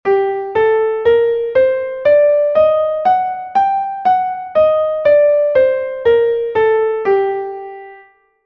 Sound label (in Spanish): sol menor armonica